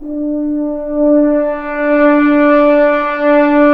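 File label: french horns, swells; Roland L-CDX-03 Disk 2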